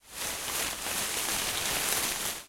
grass

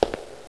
tile

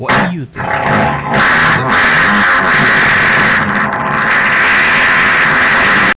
fart on door

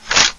sniper reload

